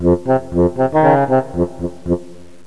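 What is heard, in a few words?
FAGOT, bassoon; Pipo-Aprende musica con Pipo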